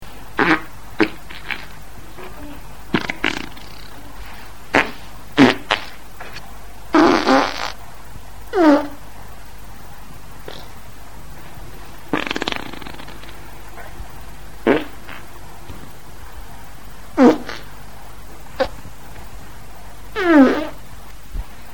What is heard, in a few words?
farts